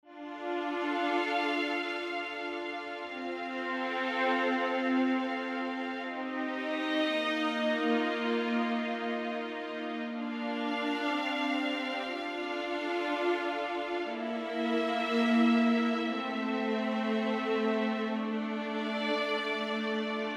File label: Sadness Emotional Theme